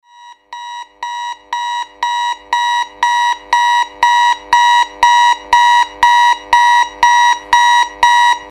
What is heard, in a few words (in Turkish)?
Saat alarmi